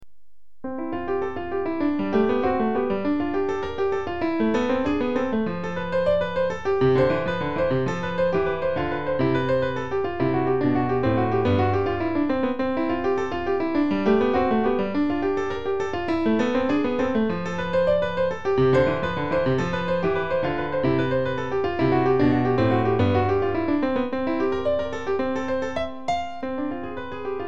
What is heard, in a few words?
Jazz Invention